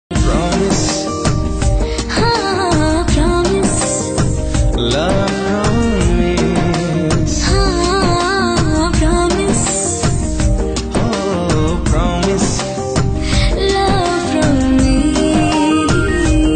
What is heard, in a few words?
Odia Movie Song